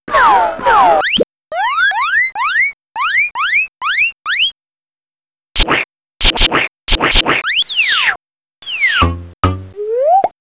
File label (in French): Serpent qui effraie